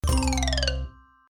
game over

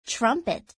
trumpet

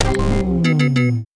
game lose effect

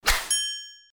hit Ball

